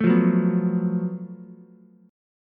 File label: Gb Minor Major 7th Double Flat 5th, Ukulele, chord; strummed; JGuitar